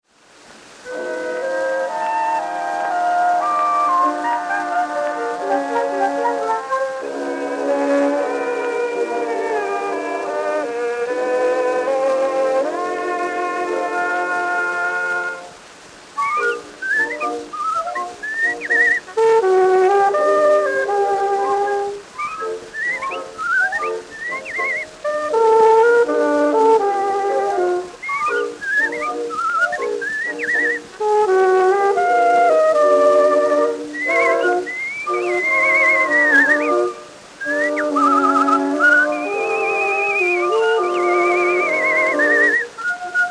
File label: The Bird And The Saxophone (Whistling); amberola; Cylinders